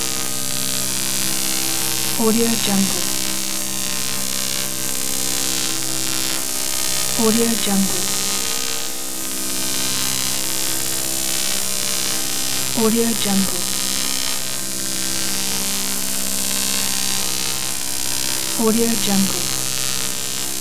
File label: static electricity drone